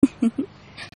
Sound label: Laugh; Lewd SFX; Dialogue